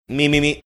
singing mi mi mi

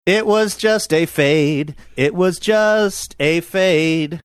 singing it was just a fade

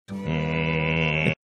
mmmmmmmm

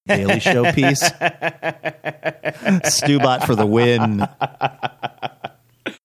long chuckling laugh

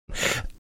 death rattle

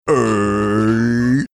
imitating stomach gurgle sound

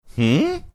hmm

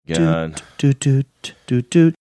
doot dooting hold music

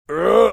making hulk like sound

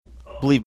making old man sound in background

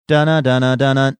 singing first few notes

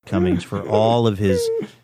crying sound background

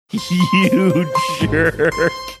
laughing saying you jerk

